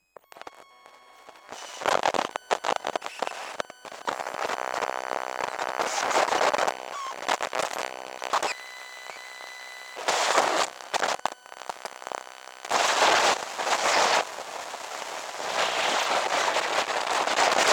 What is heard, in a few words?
Computer - Laptop - Startup - Electric guitar pickup box, Electromagnetic; Pole Position Production; The Electromagnetic Library